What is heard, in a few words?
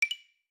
Wood open; Nokia; Audio themes